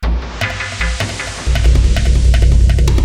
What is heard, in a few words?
Tribal Intro Fill